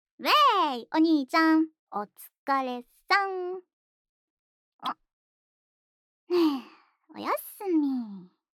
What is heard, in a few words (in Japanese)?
おやすみのチュー お兄ちゃん ver